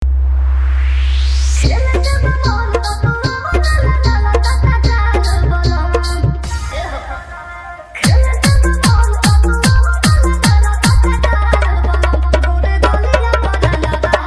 Holi Dj Song Hard Vibration Bass Mix

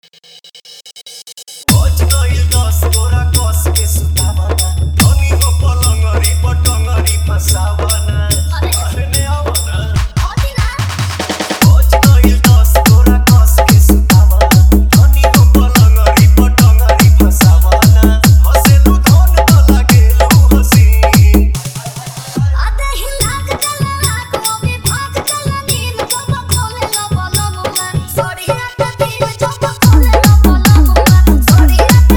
Bhojpuri Dj Remix Song Hard Dholki

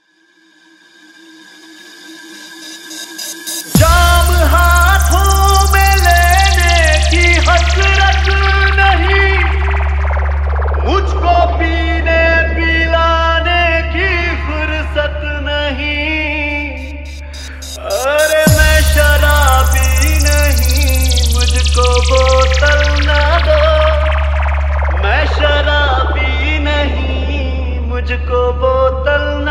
Trending Hindi Dj Remix Song Hard Electro House

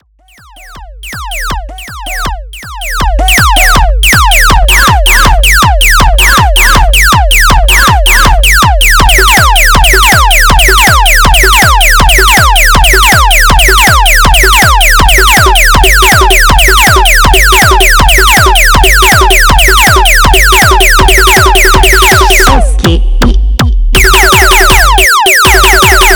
Vibration Mixx; Mela Ghamasan Siron City Tahalka

